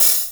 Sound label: short open high hat sound d sharp key